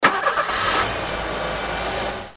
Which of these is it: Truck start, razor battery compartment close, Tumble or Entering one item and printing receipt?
Truck start